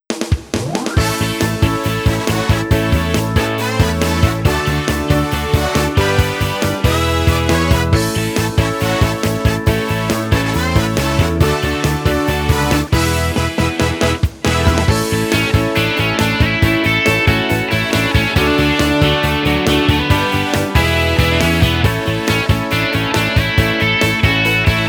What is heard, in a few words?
High Speed Game